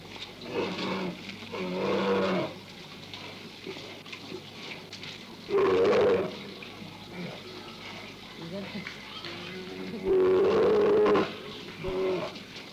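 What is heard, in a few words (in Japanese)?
ezo higuma, エゾヒグマ 鳴き声; 多摩動物公園; 北海道にすむヒグマの亜種。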